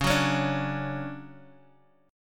D-Major 7th Db

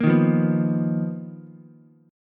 B Major 7th Flat 5th B down Ukulele; BM7b5; strummed; JGuitar

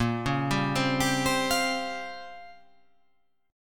A sharp Minor 6th Add 9th A sharp down, chord

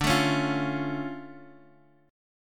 D Minor Major 7th D down Guitar Half Step Down

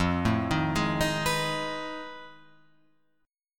F 7th Sharp 9th Flat 5th, Guitar, Open F; F7#9b5 arpeggiated

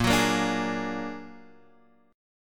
Bb Augmented 7th Bb down Guitar Half Step Down

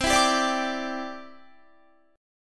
C Major Add 9th, Guitar; Cadd9 strummed

